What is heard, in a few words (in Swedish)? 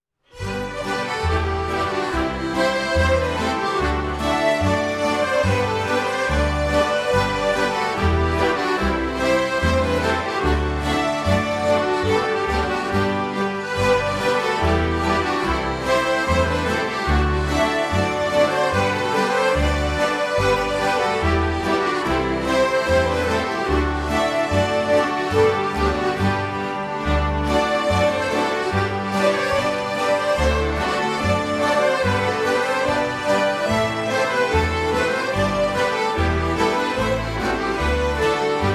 Levande folkmusik, Skäftingeschottis; från Järfälla